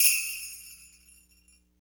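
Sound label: sleigh bells; Roland L-CD701; PRC Latin 4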